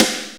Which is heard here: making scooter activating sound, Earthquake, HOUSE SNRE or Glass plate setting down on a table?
HOUSE SNRE